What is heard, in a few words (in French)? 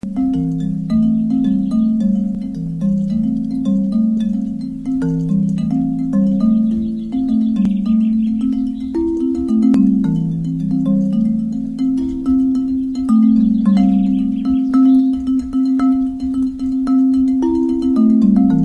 med basse doigt; argilophone pentatonique médium basse de fa; Argilophones : la terre qui chante